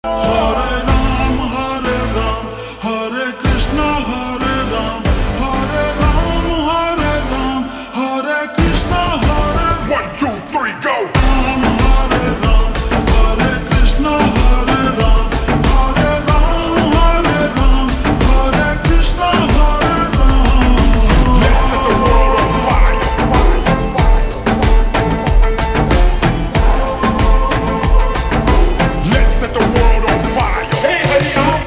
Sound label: Slowed Reverb; Bhool Bhulaiyaa 3